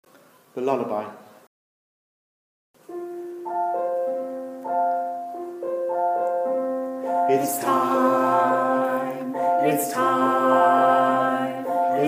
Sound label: Lullaby; mt-saplings